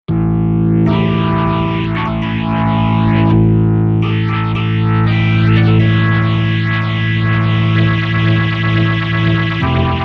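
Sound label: Organ Tube Crunch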